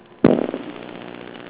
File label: giant step